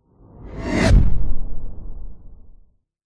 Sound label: sound design whoosh fast powerful into low frequency thud tail; zapsplat